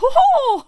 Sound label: Fencer Attack Vocals